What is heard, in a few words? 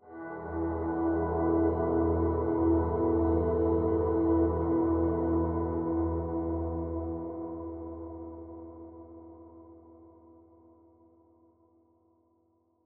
suspense; DayZ-Epoch; dayz sfx